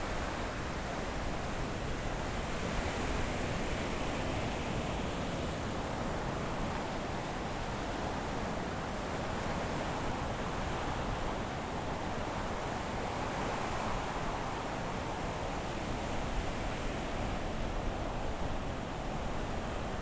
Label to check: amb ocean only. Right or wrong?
Right